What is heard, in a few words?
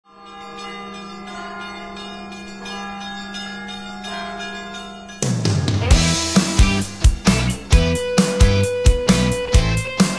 ai cover